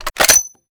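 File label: sniper ads rechamber; gmod; iw8; alpha50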